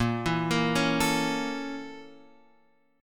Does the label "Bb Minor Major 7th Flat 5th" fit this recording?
Yes